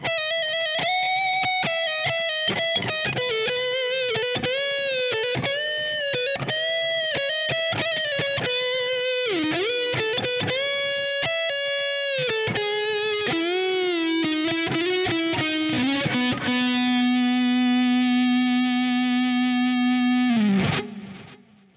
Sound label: Lead Neck, guitars les paul